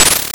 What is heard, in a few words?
Icicle drop